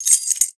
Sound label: keys, wood metal; wood metal SFX